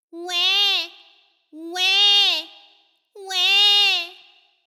Wäh Wäh Wäh

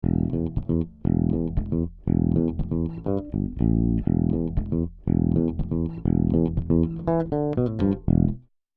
Bass ohne 5254; Rupert Neve Designs

